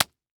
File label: Impact Wet Cloth Smacking Ground